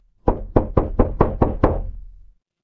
door knock

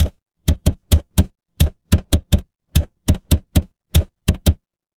mechanical machine

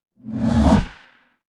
transition riser

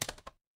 footsteps single

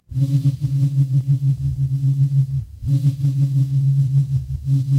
office phone vibrate

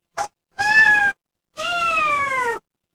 animal cat meow

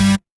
feedback buzzer

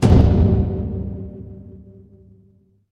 transition braam

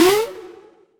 retro game jump

